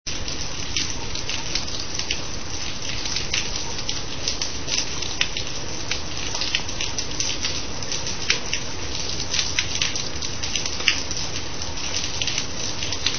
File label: rain